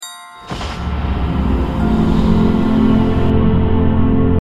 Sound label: Christmas music; Jesse McConnell